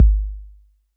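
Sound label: bass drum 808 Decay C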